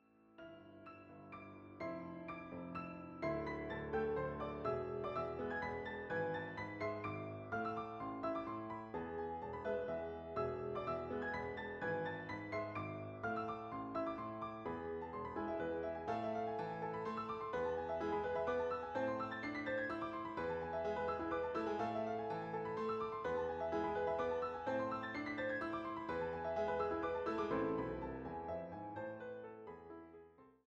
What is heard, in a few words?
4 Hand Piano